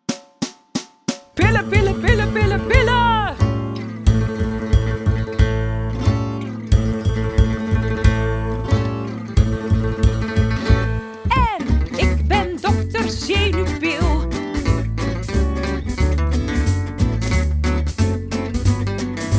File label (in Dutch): Instrumentaal